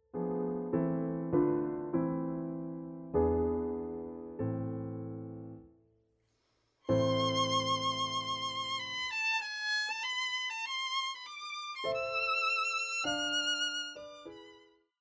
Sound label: Beethoven Violin Sonata; op12 no2 movt2; Violin Sonata No 2 Movt II